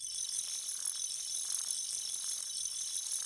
Glitchy-Trickle Loop

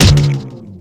Ball bounce; Nickelodeon Super Brawl Universe; Android

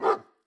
Dog Doberman

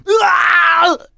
Enemy Generic Human Death Eaten

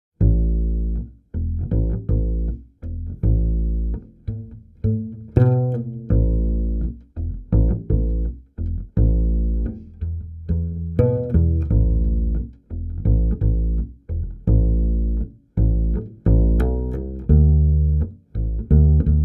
bass play music; gamerip; The Sims 2

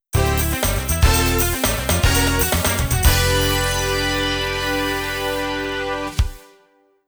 Race Finish (1st); Gran Turismo Japanese Edition

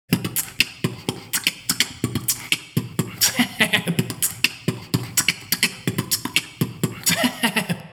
beatbox

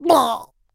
Water Splasher; gamerip

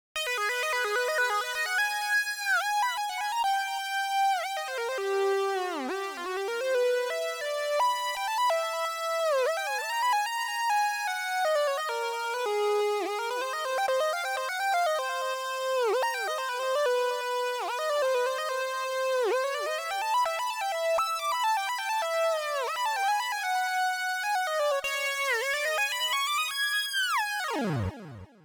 Synth Solo; music; Final boss

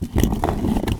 bridge turn

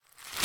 Footstep Crayon Paper

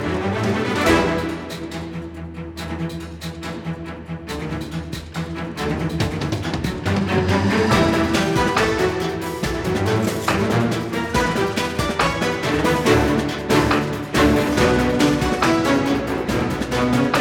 Battle High; Crucible

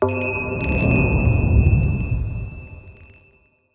sfx transition; Reigns Game of Thrones

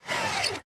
eagle boss Heart Open; unsighted; enemies

